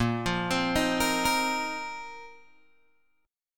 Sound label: Bb Suspended 2nd Suspended 4th Bb Guitar Open G; arpeggiated; 8,10,10,8,11,8